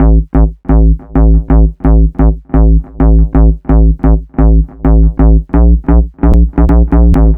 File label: Lo Bass Loop, 130bpm; Zero-G; Soundclash Nu Dance Electro; Acidized Wavs; Construction Kits; Sound Factory